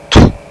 spitter, People; Sound Library 2000; Wayzata Technology

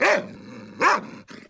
bark; DAoC; Toa

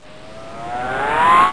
zoom in; Total Chaos Nukem 3d Pack; duke

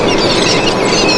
seagulls; Fishing Flash Cards

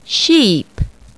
SHEEP

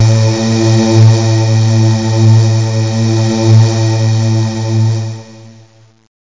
ghostly voice; Zoom - Release 2; Active Software; keyboards

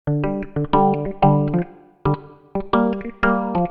Guit; FUNKIN&GROOVIN'

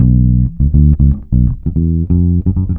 Get on !, Bass

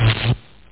shorted; duke3d

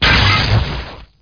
electr explo; MOTS

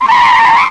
skid; Comanche

